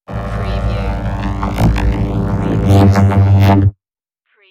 electrostatic overload burst

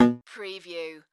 Multimedia Error

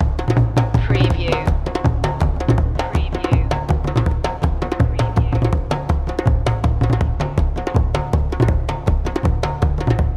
african drums lipango loop

